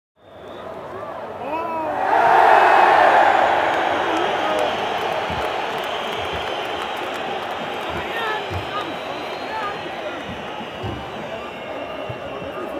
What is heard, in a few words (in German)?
Stadion Atmosphaere